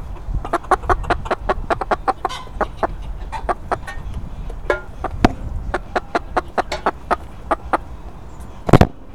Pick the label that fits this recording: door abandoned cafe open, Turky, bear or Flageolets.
Turky